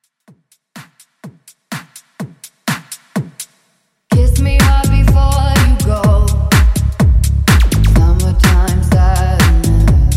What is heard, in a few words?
tech house mashup